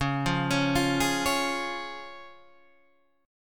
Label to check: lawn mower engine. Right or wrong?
Wrong